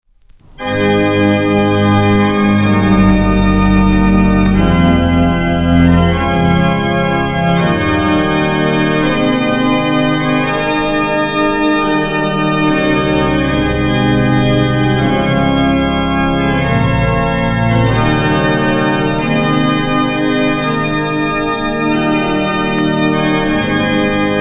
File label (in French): Plein Jeu, orgues